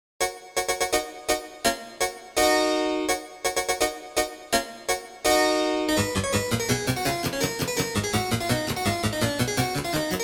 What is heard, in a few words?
March Harpsi 31edo; Nutcracker